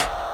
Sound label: FX; 075 Dmin